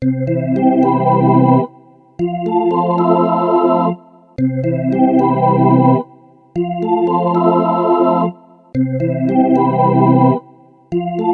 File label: Organ C Soul